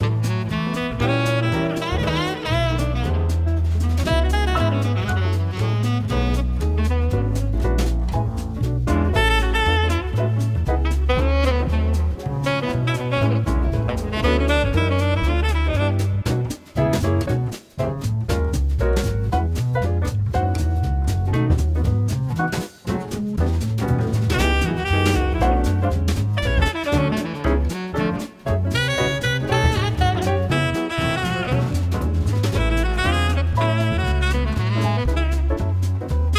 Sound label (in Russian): Музыка для чтения